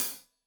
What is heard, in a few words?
Hat Loose, Hi-Hat, Cymbals Stereo